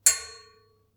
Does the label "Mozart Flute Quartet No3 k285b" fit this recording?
No